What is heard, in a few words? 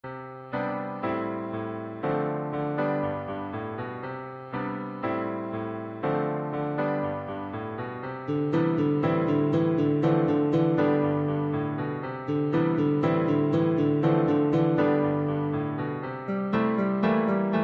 clouds gtr